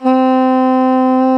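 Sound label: SOP SAX C 60, soprano sax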